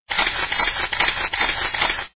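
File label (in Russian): фотограф снимает моделей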